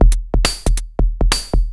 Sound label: percussion loops, Techno; PS-45 WAV Techno ID